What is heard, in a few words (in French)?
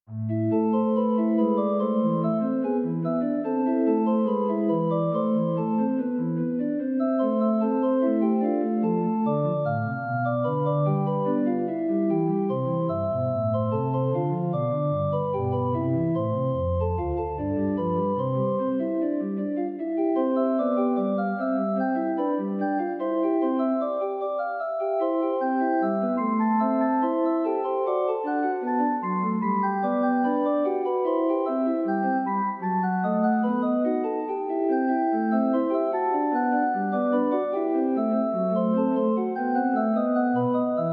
version orgue classique; Invention